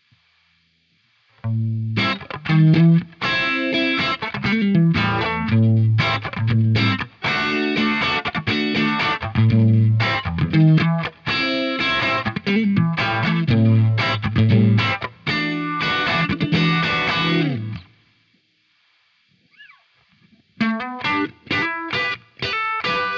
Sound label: fuzz test